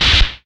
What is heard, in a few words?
DISCHARGE, guitar; Spectrasonics; Bizarre Guitar; X-POSERS